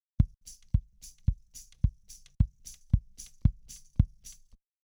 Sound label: original kick drum